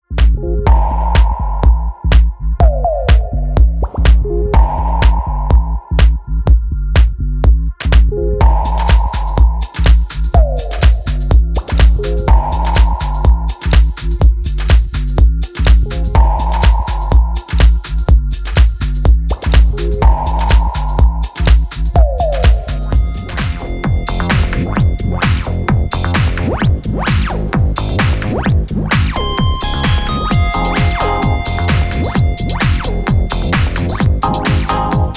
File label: Electronic Sample